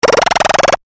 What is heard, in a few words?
Notification Buzzing